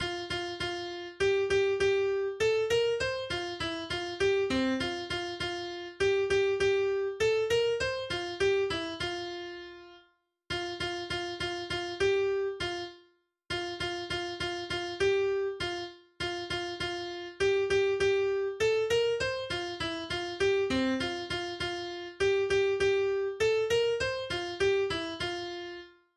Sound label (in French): Sur le pont d'Avignon musique